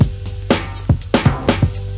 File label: DRUM LOOPS and BREAKS, 121 bpm